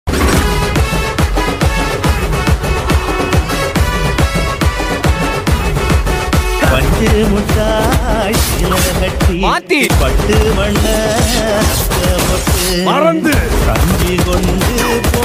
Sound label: trailer ringtone; mark antony